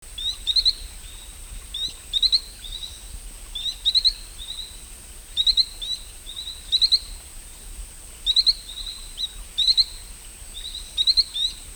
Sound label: Colostethus inguinalis, Dart Frog